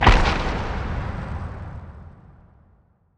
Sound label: asteroid damage interstellar huge; Factorio